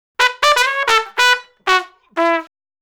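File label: 95 Acid Jazz Trumpet, Eb major; Brass; Pro Tools Essential Loops; Digidesign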